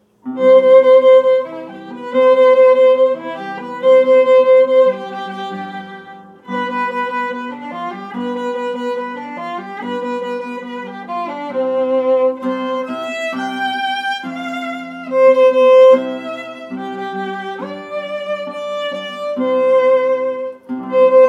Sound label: guitar arranged for violin